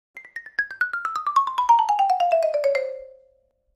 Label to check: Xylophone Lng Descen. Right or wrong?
Right